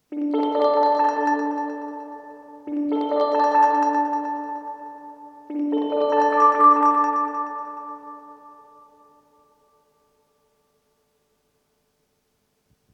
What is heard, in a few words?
Echo Fix EF X2 Mode 2 Mode max Speed